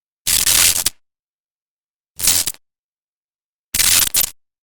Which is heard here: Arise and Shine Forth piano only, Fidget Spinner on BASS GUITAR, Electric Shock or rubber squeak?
Electric Shock